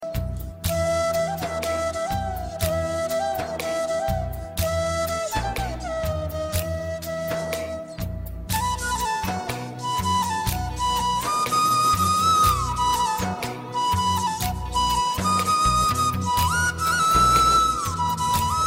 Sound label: Flute